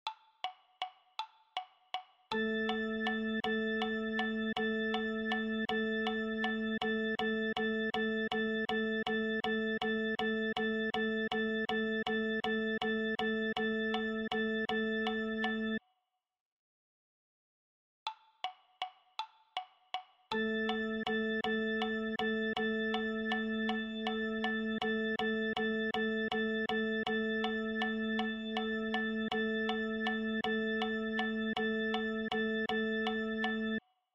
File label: Rhythm Practice A note; Unit 5